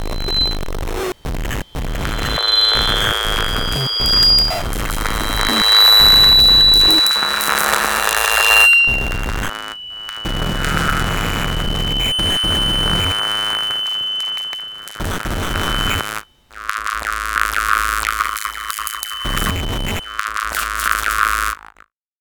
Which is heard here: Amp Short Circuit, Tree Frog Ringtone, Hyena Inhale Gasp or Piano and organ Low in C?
Amp Short Circuit